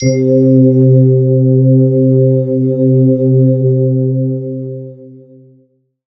pad, Dance Synths

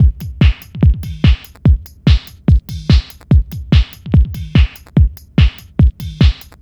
Electronic, Drums & Percussion

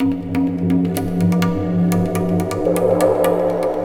Tabla, 125 BPM; Zero-G; Pure Tabla

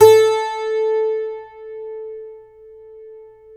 12 string a3, guitar

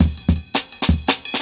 168, Jungle Breaks; Original Jungle Breaks

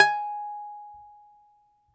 DOMRA, Russian Strings

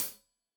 Hat Tight, Hi-Hat; Deeply Sampled Drums

